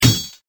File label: sword